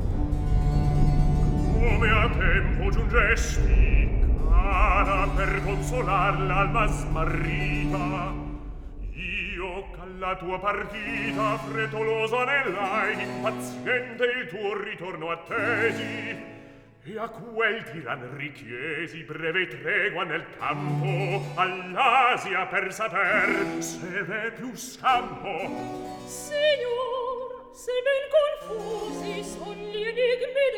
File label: Recitativo ed Accompagnato; Come a tempo giungesti; Handel; Rinaldo; Act 1